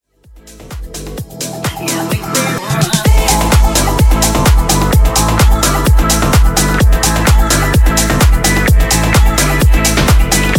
House 128bpm

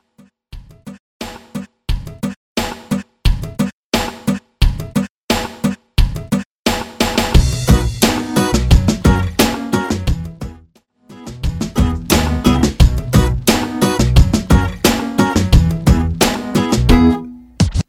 Reggae; Redrum

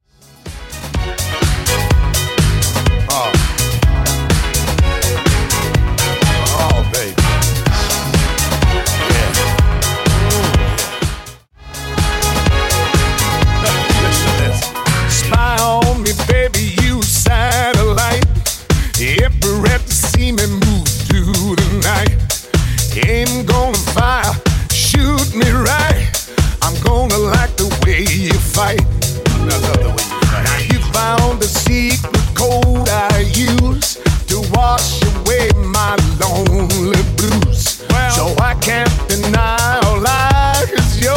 Re Drum 125BPM; Snippet

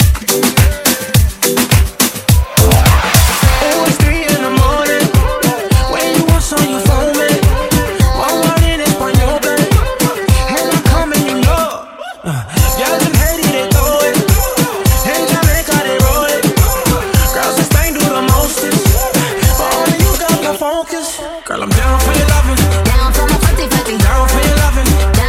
With Spanish Verse; Remixfreakz; Redrum

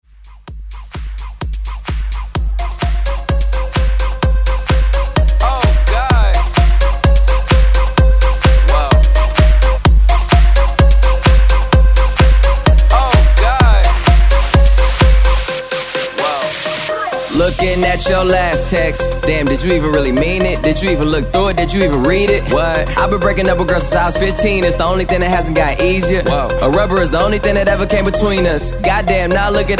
128 BREAKMIX MASHUP

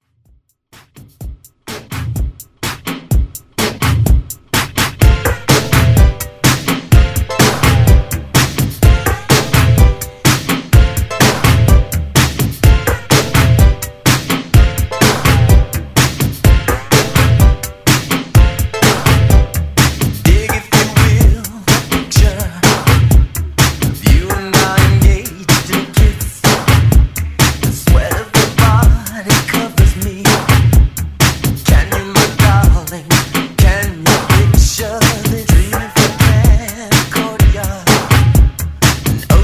80s Pop Redrum

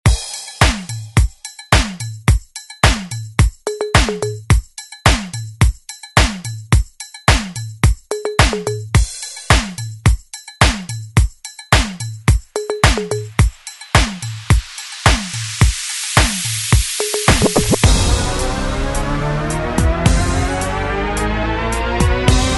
2023 ReDrum

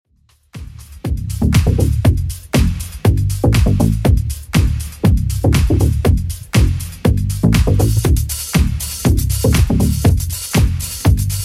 80s Redrum 120bpm

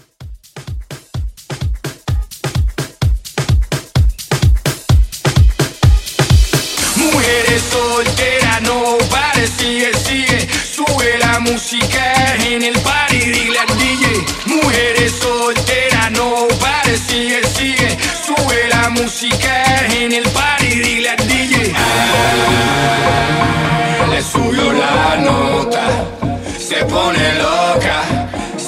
Latin Tribal Re Drum 128

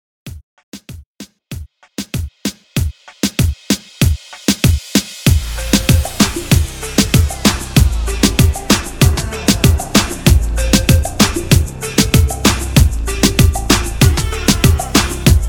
MOOMBAH REDRUM 96 BPM

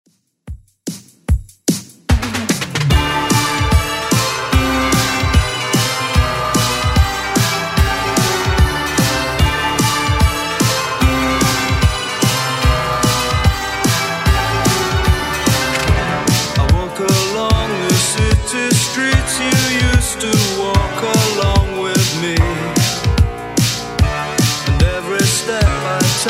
80s Redrum 148bpm

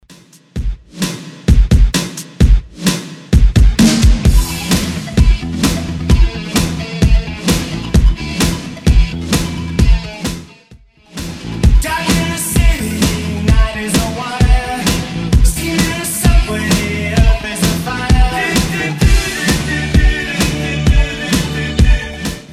80s Classic Rock 130

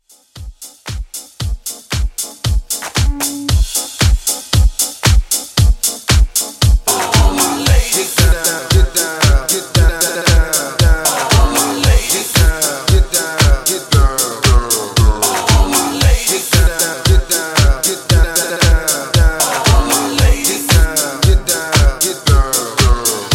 70s 115

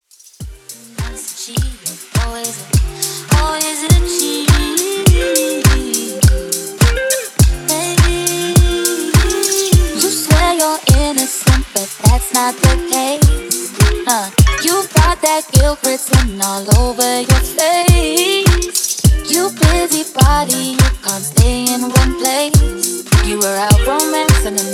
Club Re Drum Top40 103 BPM; remixplanet

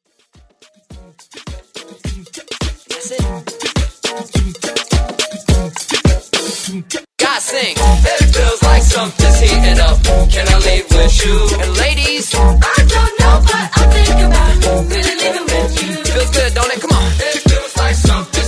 Moombah Reboot

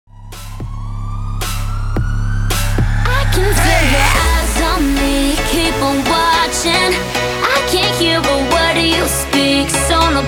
dubstep remix 110 bpm

